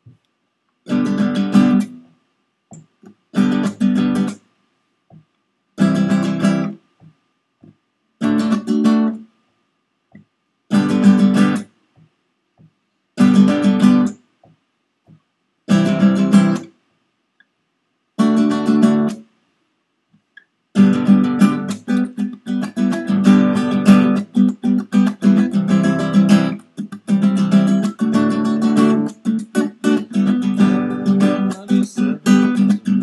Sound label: Chords